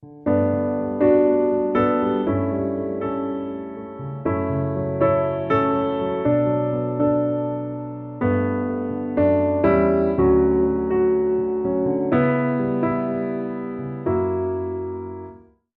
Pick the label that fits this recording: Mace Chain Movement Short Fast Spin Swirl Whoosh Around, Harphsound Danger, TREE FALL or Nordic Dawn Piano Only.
Nordic Dawn Piano Only